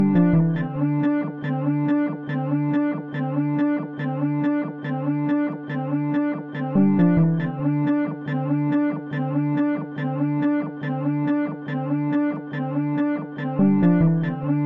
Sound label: ELECTRONICA